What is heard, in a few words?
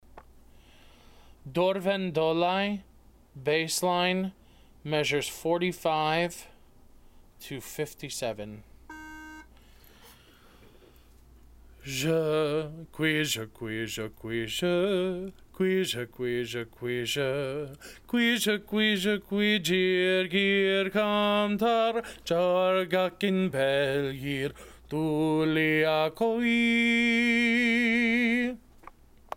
bass